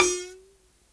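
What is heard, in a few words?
BENT MARIMBA F 4; Spectrasonics; Heart of Africa 1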